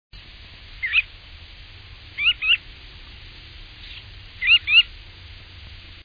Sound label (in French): Becasseau Maubeche; Scolopacides; Le Bécasseau Maubèche